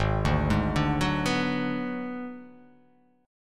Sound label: Ab Minor 7th Flat 5th Ab, down; arpeggiated; JGuitar